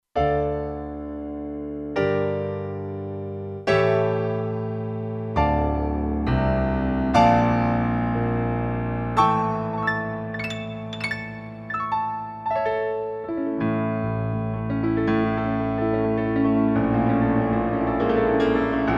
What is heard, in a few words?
RandomPan Grand, Kurzweil K2500X; Factory Programs